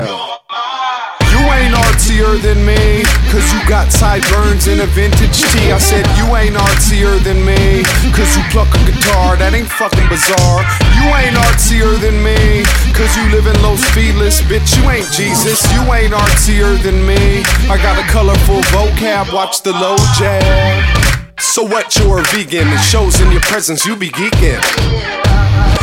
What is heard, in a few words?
Artsy Dubstep